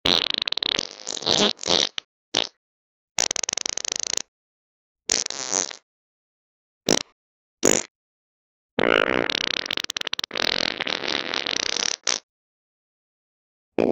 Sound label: a bunch of farts at; detonation